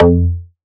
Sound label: Bass F, Bass Synth